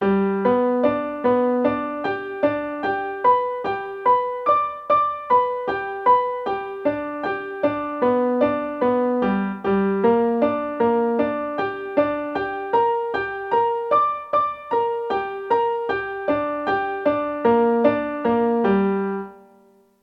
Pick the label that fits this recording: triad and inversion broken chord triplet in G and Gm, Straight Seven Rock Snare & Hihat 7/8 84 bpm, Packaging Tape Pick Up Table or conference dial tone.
triad and inversion broken chord triplet in G and Gm